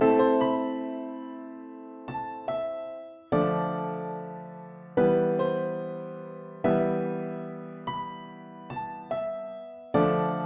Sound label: Piano Music